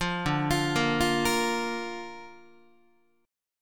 D Minor Sharp 5th D down Guitar; Dm#5 arpeggiated; 8,10,8,8,9,9; JGuitar

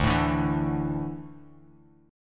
D Suspended 2nd D down; strummed

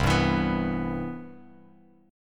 C Major 7th, Bass Standard; CM7 strummed

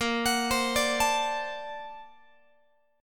A sharp Augmented Major 9th Guitar; arpeggiated; JGuitar

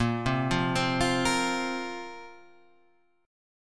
Bb Suspended 2nd, Bbsus2 chord; arpeggiated

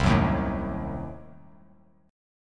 C Diminished 7th down Bass Standard; strummed; JGuitar

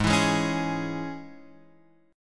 Ab Suspended 4th Sharp 5th Ab, Guitar Open F; x,3,4,3,4,3; strummed; JGuitar

